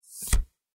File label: cards fold muck; poker game